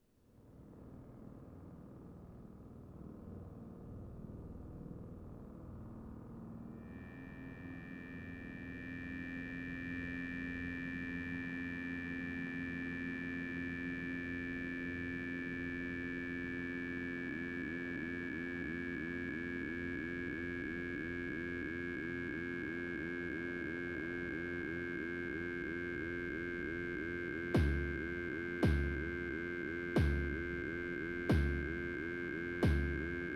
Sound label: november noise